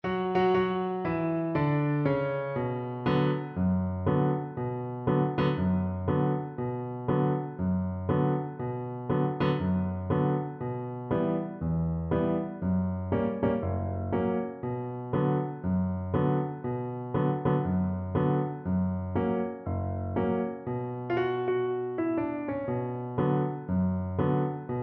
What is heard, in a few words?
Bass Guitar